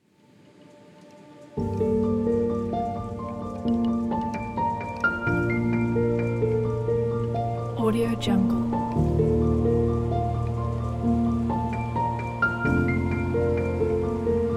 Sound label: calm